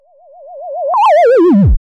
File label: comic doop reverse